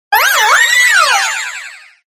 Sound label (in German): Ruf